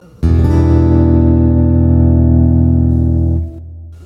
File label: e min chords 022030